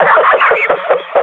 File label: laugh, combine grunt; vo